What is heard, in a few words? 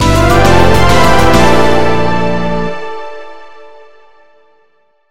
Jingle Slight Break